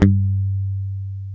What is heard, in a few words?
G 3, hip-hop, bass; Spectrasonics; Bass Legends CD 1